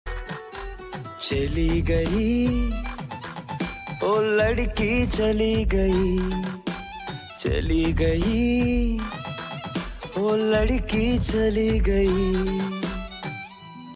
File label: hindi ringtone sad ringtone